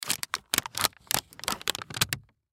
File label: Bandage Wound, Bandage Wound Dressing Package Open; Surgeon Surgery; Hospital; Emergency